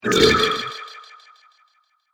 Liquid Tension Experiment Spell, magic sound effect; fantasy sound effect